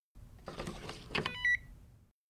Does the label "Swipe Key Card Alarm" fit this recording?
Yes